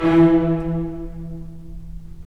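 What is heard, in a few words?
STR, strings; Roland - LA Composer vol. 4; Full Short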